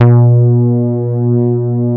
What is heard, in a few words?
ESQ 1 MOOG, Synthesizers; Soli-Music; World of Synthesizers VOL-1